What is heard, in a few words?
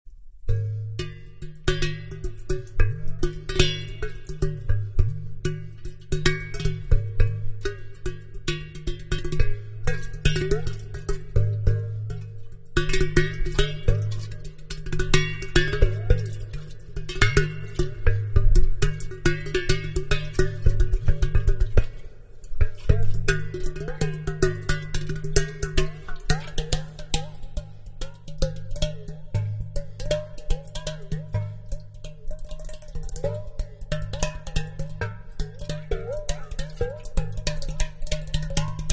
Teapot With Water